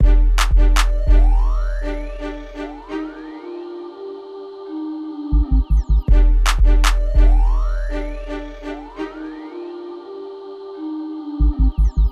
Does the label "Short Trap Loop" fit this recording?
Yes